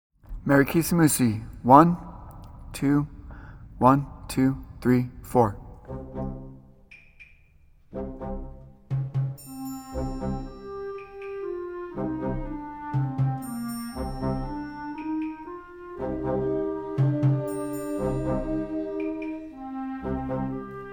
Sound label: fast play-along track